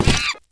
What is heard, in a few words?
body medium impact soft